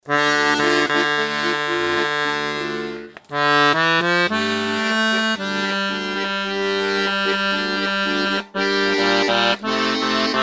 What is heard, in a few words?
accordion